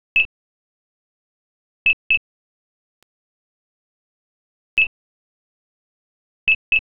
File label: buzzer